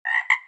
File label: frog